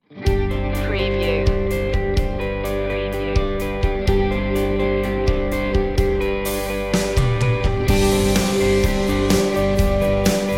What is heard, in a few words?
countryside underscore